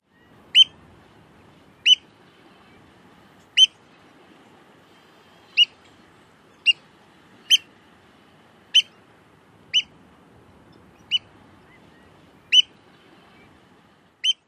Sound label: peeps